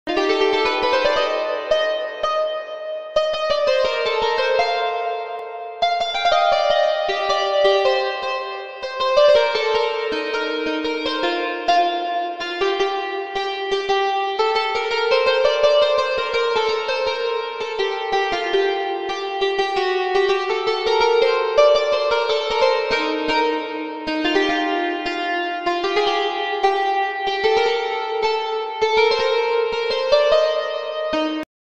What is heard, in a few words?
piano bgm